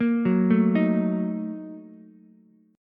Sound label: Bb Major 7th Suspended 4th Sharp 5th, Ukulele; arpeggiated; JGuitar